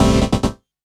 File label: KEY SHOT TEKNO 140 A; Techno2; Singleshots